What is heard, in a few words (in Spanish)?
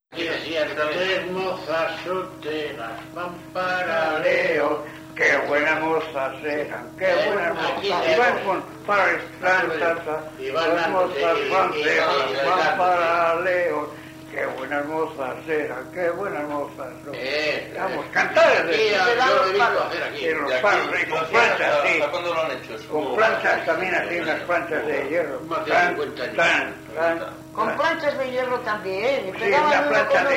Paloteo; Melgar de Arriba